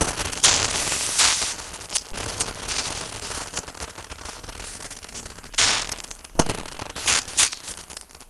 elec lightning magic arc loop; Infernal Radiation

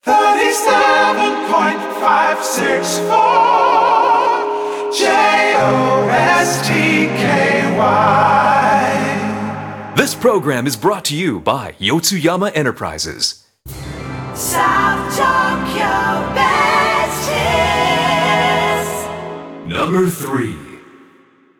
Radio Jingle; let it die

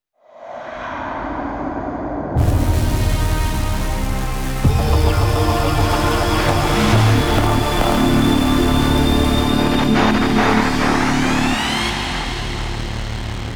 Zombie Generator On Dark; Zombie DLC5

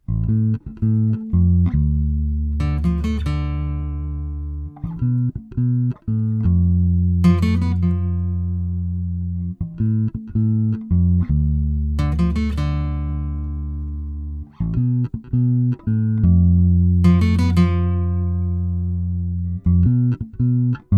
Bass and Guitar Session; majora's mask 20th anniversary tribute

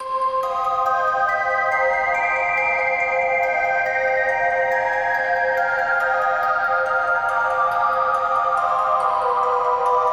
Music Box Echos; the child indie horror game windows

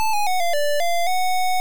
Miss; arabian arcade

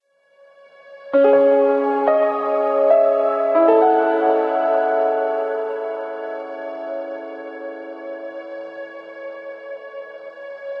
Village Sting; ether one redux gamerip 2015

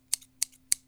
Scissor Snip; The 39 Steps

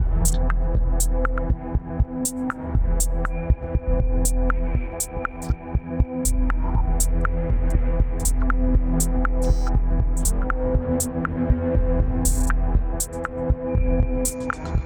Music Boss (Boss Fight Intensity=Medium); Music Boss Hideout3; BossFight Phase=Phase1; Kuroki Phase1 Loop

